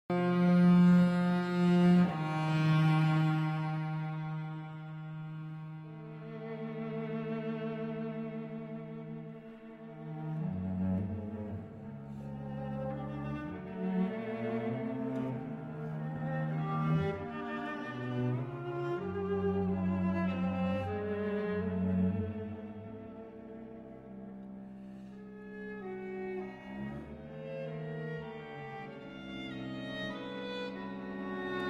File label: String Quartet No. 3 in C-Sharp Minor Moderato